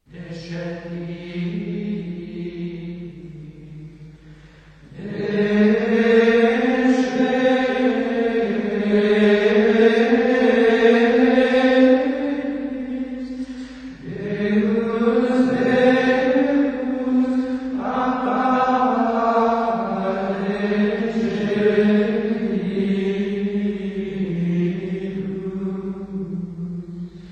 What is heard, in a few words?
organum; Descendit de coelis